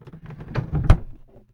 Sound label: drawer close